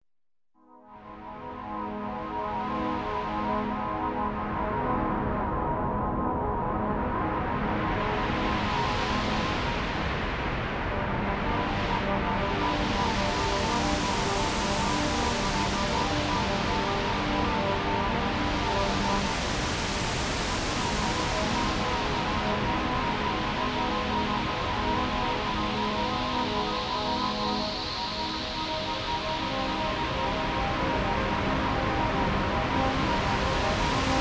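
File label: synthesizer melody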